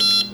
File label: type; 1000fx; OFFICE